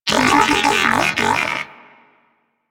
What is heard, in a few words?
Moa Pet Enemy Spotted; Warframe; Tenacious